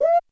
Hi Woo, Drums, Roland JV 1080

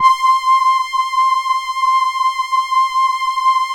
LAMBDA, String Machines

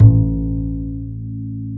DBASS A; Univers Sons; Jazzistic

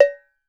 MELLOW AG, POP ROCK SET

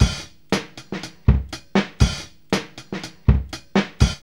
ODD BEAT 120, Funky Elements loops; Zero-G

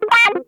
STRANGLED, Funk Guitar; Zero G - Funk Guitar

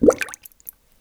Bubbles; musicradar; cartoon caper

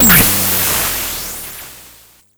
Cwejman S1 Huge Noise Snare

